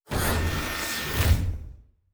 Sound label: Door, Sci-Fi Sounds